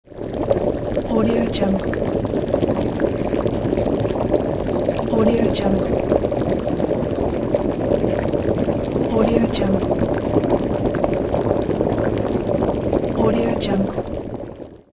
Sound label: water boiling